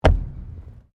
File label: METAL IMPACT THUD; Materials